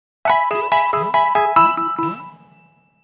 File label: pokemon healed; pokemon x y